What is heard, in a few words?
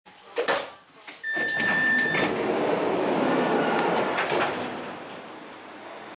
Metro G5 door open, Metro G5 døråbning; Emil Glentvor, Oktober 2022, Metro